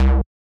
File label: Sq Bass C1, 140bpm, Grime; Zero-G Sound Sense Grime Scene; Construction Kits; Astoria